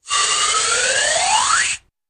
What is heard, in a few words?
Airy Hollow Inflate, impacts